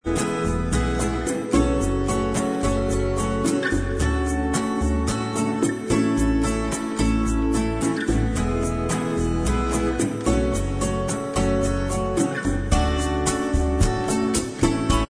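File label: bossa style instrumental track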